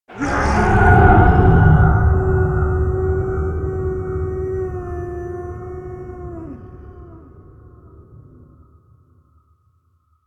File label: Spooky Werewolf Intro